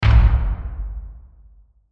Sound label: Impact Designed Thud Deep; Drops; Blastwave